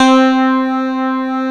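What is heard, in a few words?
DX synth keyboard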